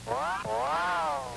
train

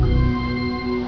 open

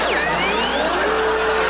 stop

